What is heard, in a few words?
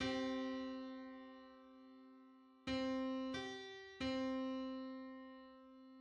Hundred-eighty-ninth harmonic on C